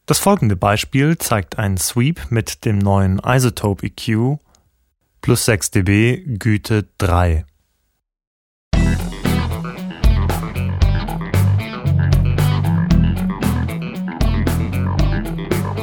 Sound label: EQ Sweep